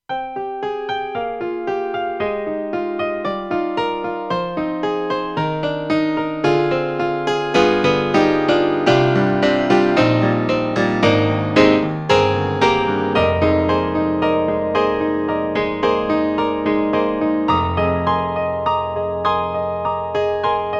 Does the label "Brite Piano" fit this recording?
Yes